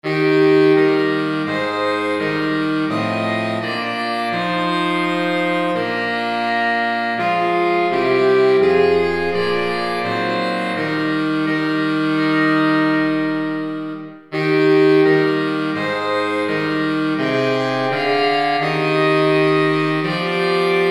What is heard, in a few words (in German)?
saxophonquintett